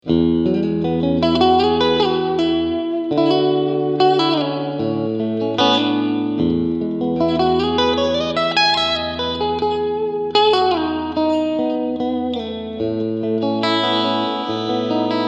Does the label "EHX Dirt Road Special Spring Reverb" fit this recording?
Yes